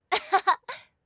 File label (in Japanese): あっはは